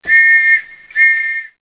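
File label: police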